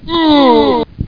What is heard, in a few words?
UGH